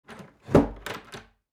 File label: door close